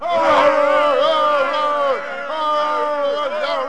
crowd; Interactive Media Design Review 1999

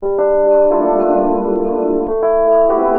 SYNTH D+B 160 C, Drum+Bass, Keys; eJay

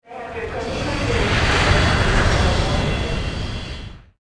AIRPORT; buildings

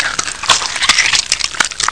Flesh Goop

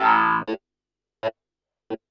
synth house 125 A; eJay; Keys

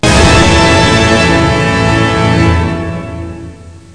orchestra hit; chords

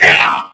pain; QUAKE2; megaman

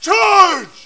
charge

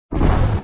missile explode; operation graduates

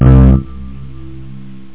digi piano

